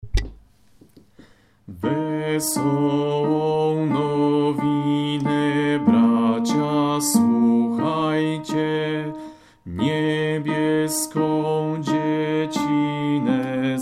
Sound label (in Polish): wesola nowine alt; koledy